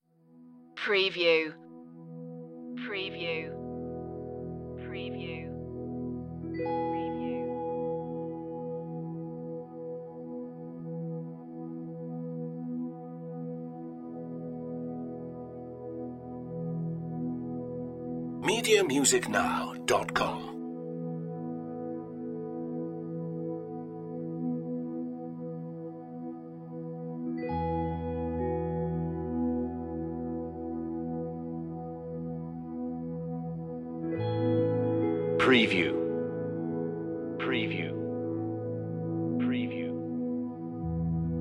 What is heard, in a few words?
no e-piano